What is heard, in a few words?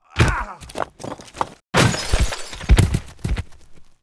duel outro amb win; Sid Meiers Pirates; XBOX